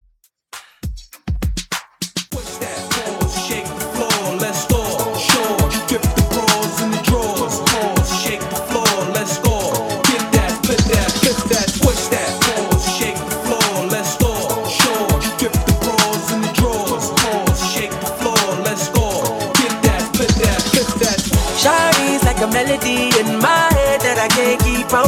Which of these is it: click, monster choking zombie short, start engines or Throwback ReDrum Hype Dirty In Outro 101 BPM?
Throwback ReDrum Hype Dirty In Outro 101 BPM